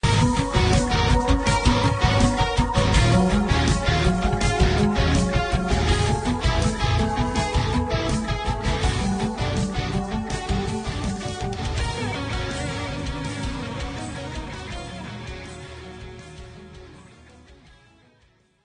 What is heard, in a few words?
level menu; svencoop; angel; sectore